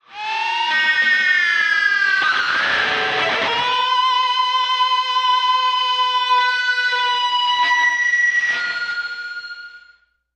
Music E Guitar Weird

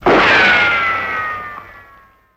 Bullet Ricochet Ban, riccos

